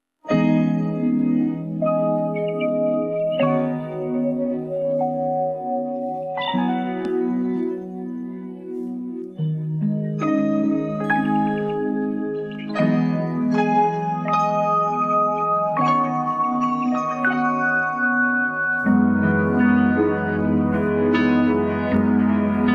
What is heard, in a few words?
Instrumental